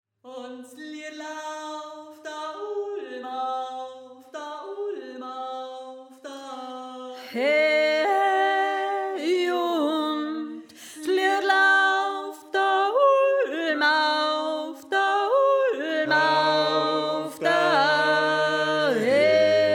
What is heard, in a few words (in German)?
Sopran